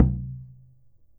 CHANG GO, KOREAN DRUMS; AKAI S6000 CD-ROM; WorldSounds

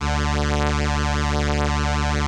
ELKA, String Machines; Best Service; XX Large Most Wanted 2 String Machines

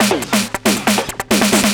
drm 138, Techno, drum-loops; Techno ID; rhythm

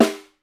SN, Percussion

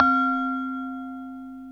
BELL LO, ORCHESTRA

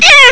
"aauu", Amiga Soundtracker sample; Amiga Soundtracker Sample Packs

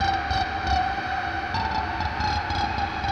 big ambient, Drum N Bass; Sonic Foundry (Sony Creative Software); Knutrix Drum & Bass

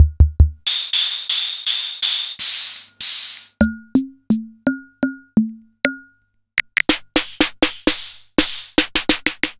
Gulbransen SAR-1

